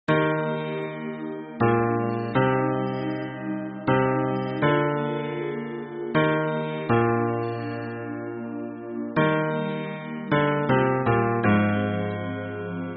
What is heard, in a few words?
hymn bass